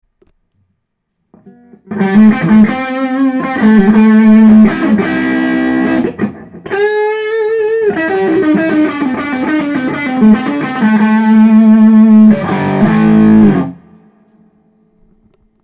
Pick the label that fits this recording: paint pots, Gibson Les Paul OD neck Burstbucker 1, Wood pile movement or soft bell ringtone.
Gibson Les Paul OD neck Burstbucker 1